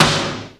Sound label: SNARE AARGH; Roland L-CDX-01; Snares 2; Sn Modules 2